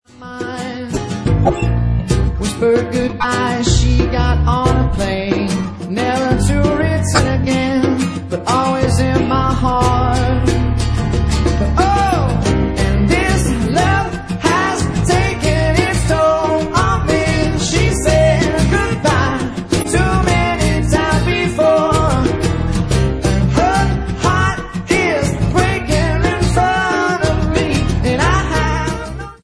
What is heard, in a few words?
acoustic version